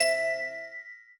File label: ui card bounce